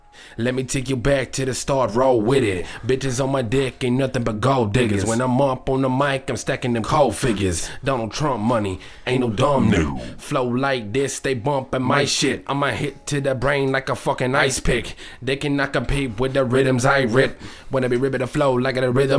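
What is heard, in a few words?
HH rap sing 97 A sharp m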